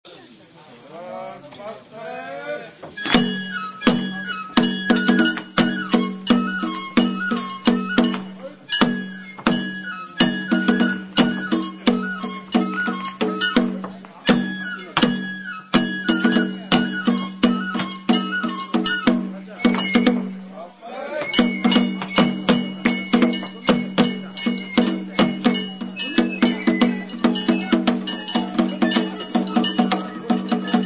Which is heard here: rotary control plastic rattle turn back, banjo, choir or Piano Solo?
banjo